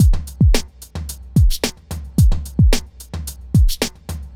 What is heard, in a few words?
r n b kit 110